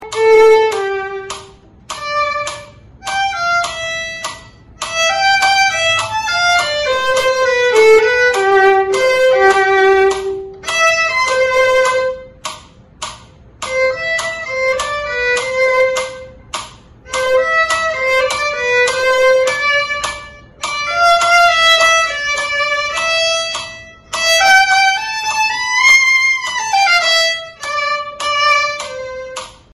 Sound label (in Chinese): 小提琴; 玩具交響曲; 篠崎小提琴教本 新版; 示範演奏